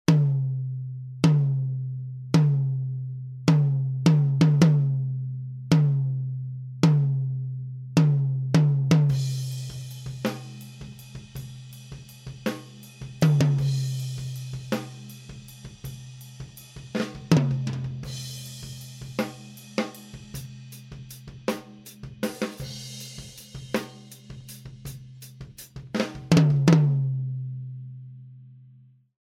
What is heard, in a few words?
Tom Microphone D4